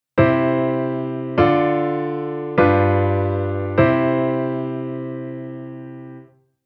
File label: Chord Cadence